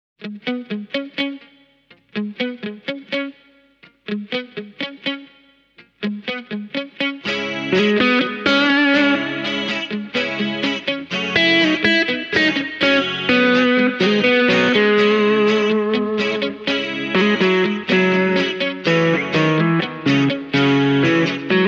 prs se custom 24 roasted maple demo song guitars only